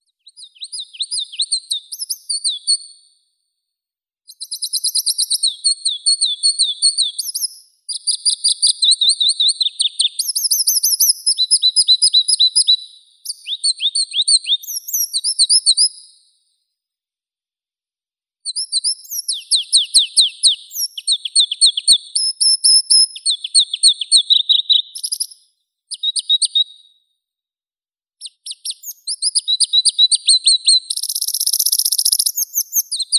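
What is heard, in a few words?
Pallas's leaf warbler Phylloscopus proregulus, nature sounds; penochka korolkovaya; zvuki prirodi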